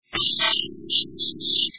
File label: jackhammer